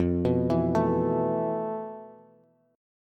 F Major 7th Flat 5th Bass; arpeggiated; JGuitar

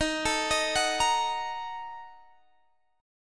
A sharp Major 7th Suspended 4th Sharp 5th, guitar

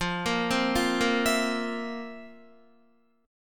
F Major 7th Suspended 4th F, Guitar; arpeggiated

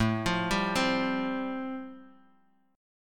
A-Minor 7th Sharp 5th, Guitar Open D, chord

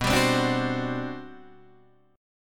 G Major 11th B

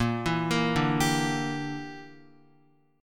Bb 6th Flat 5th Bb down Guitar; Bb6b5 arpeggiated

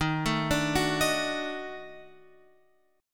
Eb Minor Major 7th Flat 5th Eb down Guitar Open F; EbmM7b5; arpeggiated

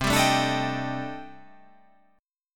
C Minor 6th Add 9th C

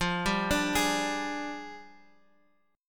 F 6th Suspended 2nd Flat 3rd F, chord

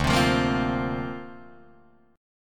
D-Minor 7th Sharp 5th, D, Guitar, Drop D

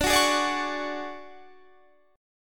D Major 7th Flat 5th Guitar Standard, chord; strummed; JGuitar

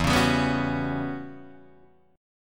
E Minor 6th Add 9th, Guitar, DADGAD; chord shape

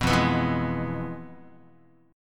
F-Minor Major 7th Flat 5th, Guitar; FmM7b5 strummed; JGuitar

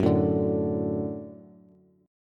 Gb Minor Sharp 5th, Bass; strummed; x,9,7,7; JGuitar

